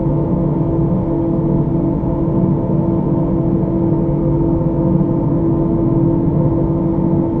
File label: large fan; svencoop; amckern